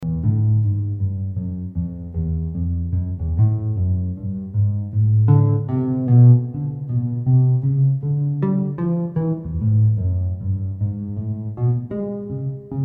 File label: Acoustic Bass; Scott Van Duyne's Acoustic Bass